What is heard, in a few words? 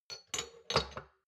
shot glasses stack soft; berufe; gastronomie; soundpool